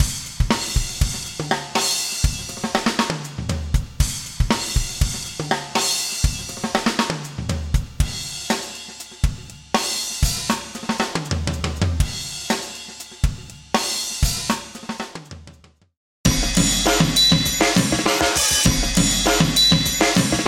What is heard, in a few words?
hip hop drum fills